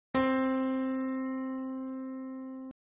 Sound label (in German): Ton, Klavier einzelne Toene